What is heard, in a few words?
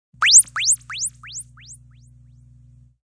Long Zap; Zaps